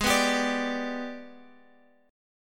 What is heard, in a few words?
G sharp Minor Major 7th Flat 5th, Guitar Open F; G#mM7b5 strummed; 20,18,19,21,x,x